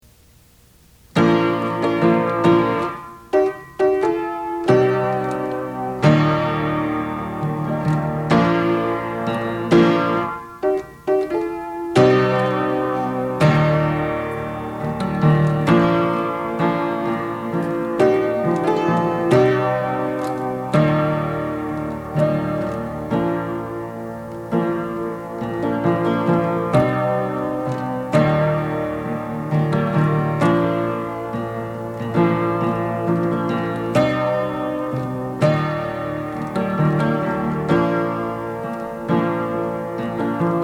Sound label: piano